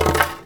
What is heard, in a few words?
Plank Stn Slide; moul-assets